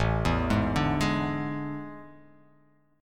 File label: A Major 7th Suspended 4th